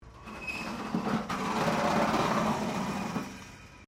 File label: METAL BIN SCRAPE WIRE FENCE